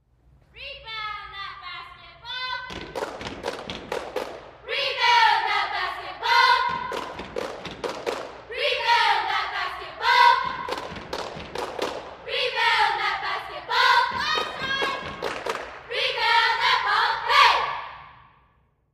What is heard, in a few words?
Cheerleaders High School; Cheer 1; Rebound That Basketball, Interior Reverb, Teen Girls